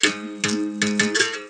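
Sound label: berimbau toot; Musical Instruments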